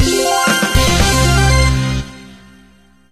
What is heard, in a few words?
Get Bat; Entaz Baseball 2011; Android